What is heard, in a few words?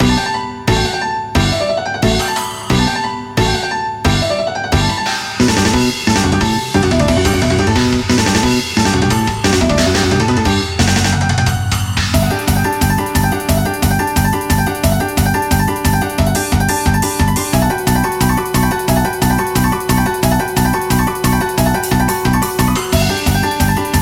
Chocolate bpm 178